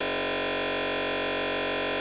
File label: Instrument